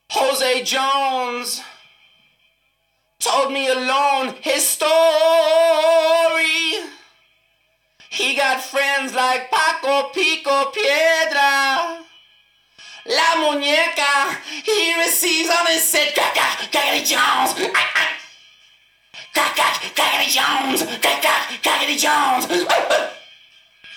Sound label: vocals